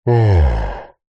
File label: fantasy giant male sigh